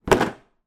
industrial tool box small plastic few tools inside place down